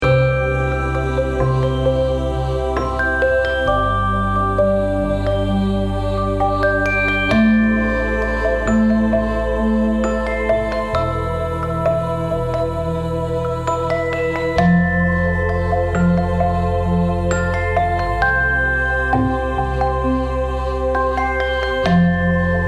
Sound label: Healing Melody; short version